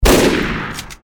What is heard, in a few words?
Rifle